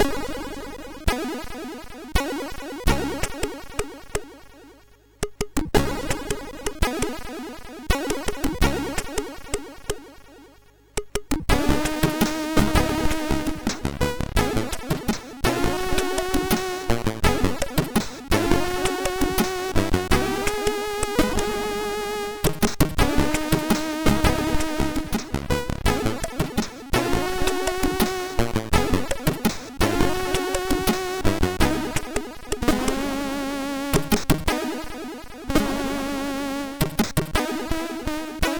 Relaxing, MOS6581R4

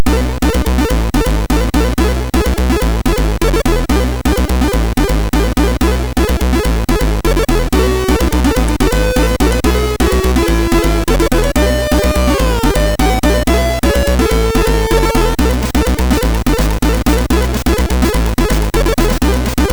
intro MOS6581R3; Sonic Boom

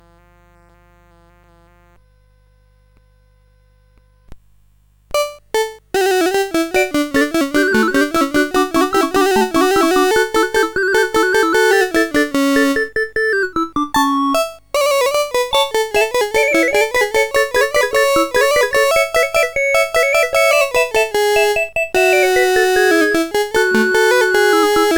Canon in Unison, CSG8580R5

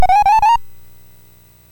sid MOS6581R4; Hard Hat Mack

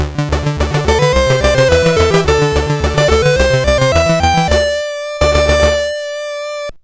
sid CSG8580R5, GAMES; Continental Circus

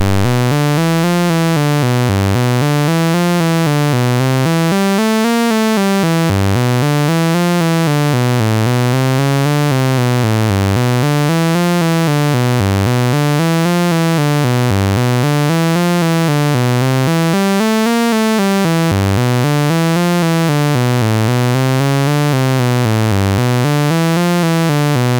Swinging BASIC, MOS 6581; MOS6581R2

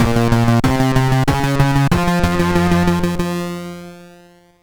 Race, sid, MOS6581R3

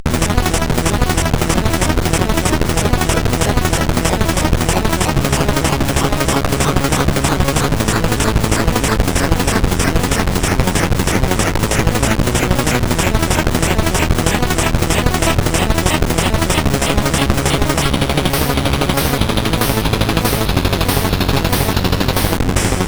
Pick n Pile, CSG8580R5

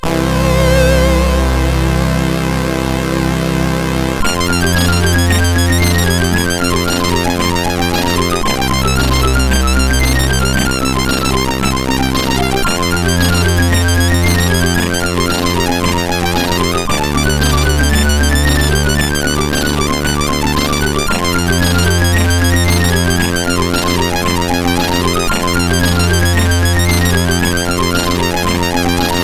E-Load loader, CSG8580R5; hvsc

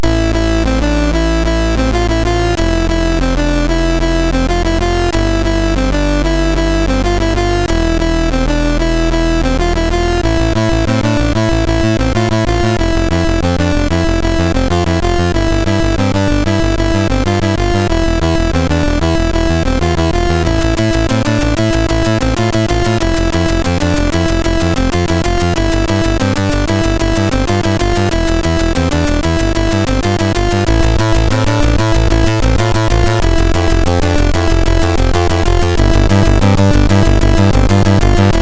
Bass Guitar, MOS6581R3

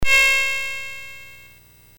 BASIC sid MOS6581R4, GAMES; Sword of Fargoal

